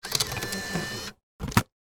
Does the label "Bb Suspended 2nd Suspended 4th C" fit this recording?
No